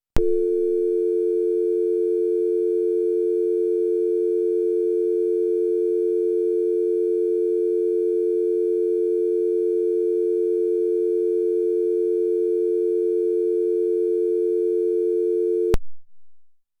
dial Tone Test

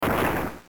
rock throw

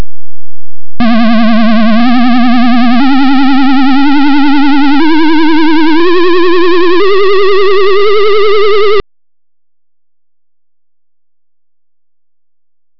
OSC II

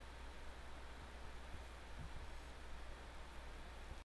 Noise Med

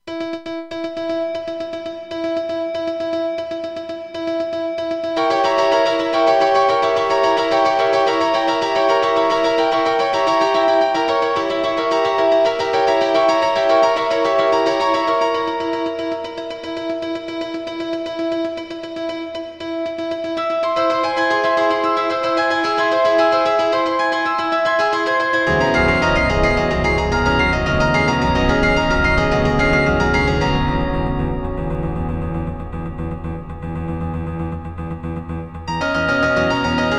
Piano

